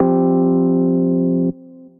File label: Dark Chord Piano; Piano & Keys